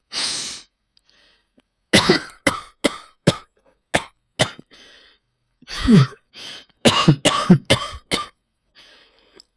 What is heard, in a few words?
crunchy cough with realis